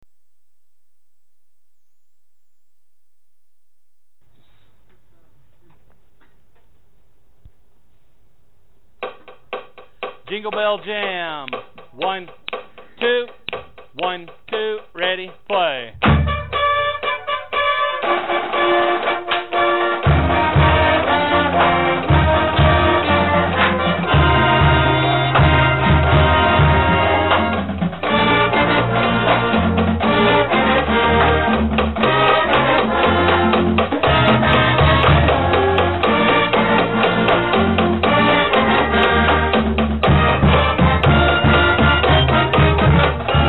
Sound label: jingle bell jam